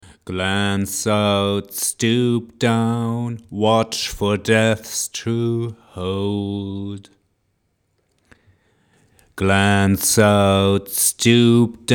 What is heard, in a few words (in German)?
Kompressor 20 1